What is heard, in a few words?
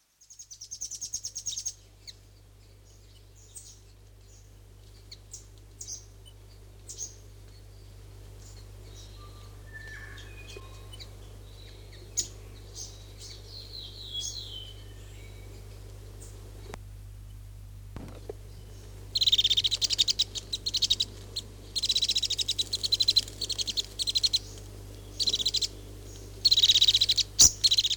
whitehead song; conservation, native animals, birds, bird song